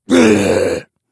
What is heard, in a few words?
slash; cstrike